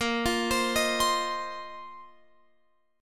A sharp Suspended 2nd Suspended 4th down Guitar Open F; A#sus2sus4 arpeggiated